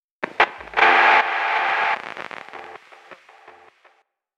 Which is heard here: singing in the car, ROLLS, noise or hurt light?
noise